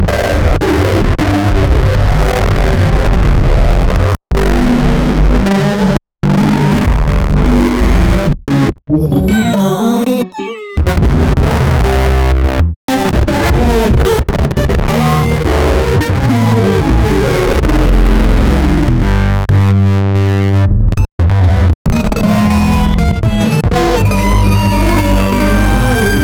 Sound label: asmr audio, studio; 47 second